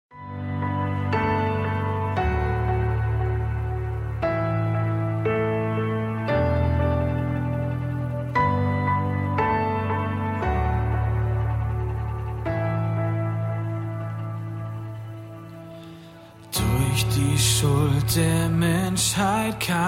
akustik session

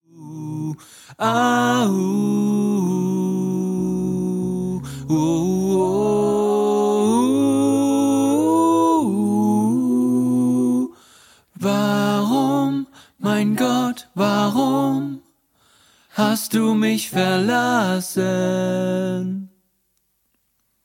Lern-Tenor

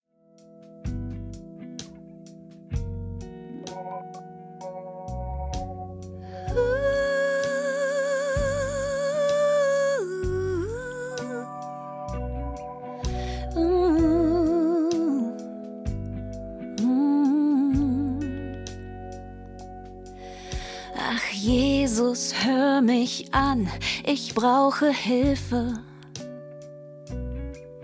Lern Sopran und Solo

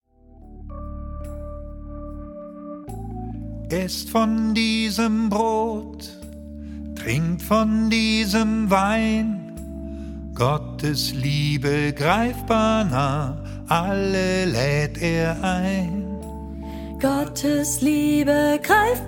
Lern Sopran und Solo

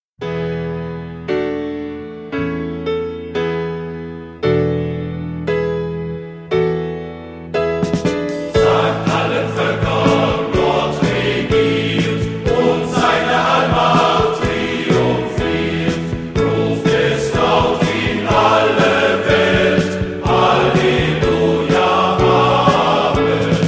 Maennerchor; Derschlag

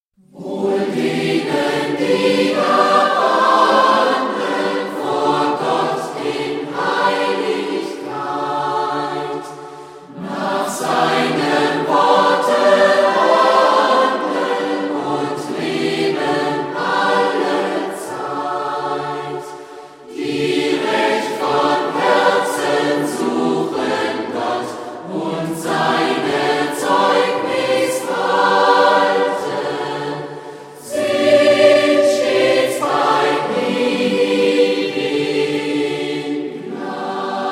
Chor

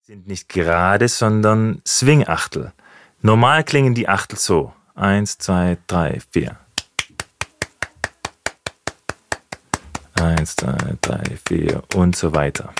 Rhythmus

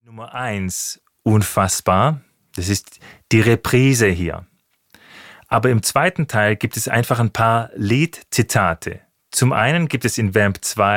Rhythmus

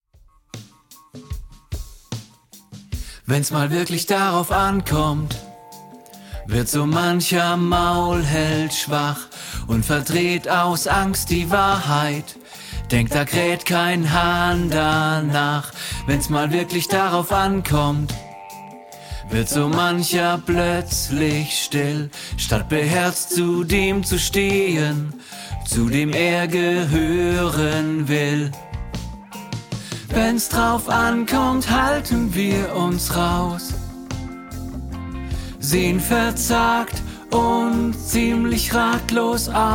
Lern Tenor

